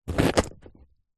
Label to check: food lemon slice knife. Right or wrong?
Right